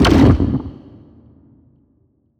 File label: organs; Thalamus; CARRIER